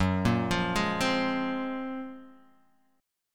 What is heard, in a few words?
Gb Major Sharp 11th Gb Guitar Open G; chordshape; down